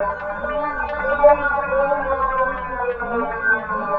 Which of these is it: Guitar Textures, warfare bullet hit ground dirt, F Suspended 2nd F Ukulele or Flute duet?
Guitar Textures